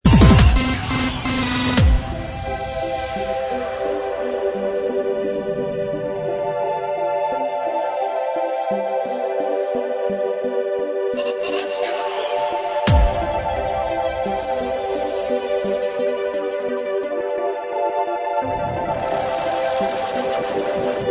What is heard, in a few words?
Keep Stompin, happy hardcore